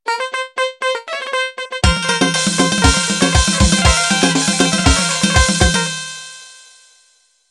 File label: Cifteteli Intro